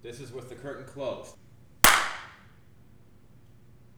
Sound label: closed clap stage, Flutter Echo; handclap; curtain; Reverberation Time (TR60)